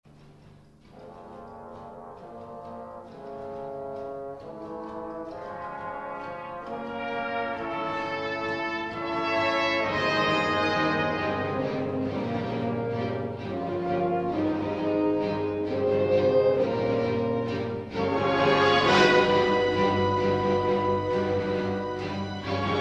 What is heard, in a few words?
music